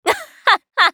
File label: laugh; Eternal Return; Hisui; JPN